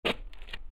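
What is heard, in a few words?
grit cement shuffle, footsteps; chief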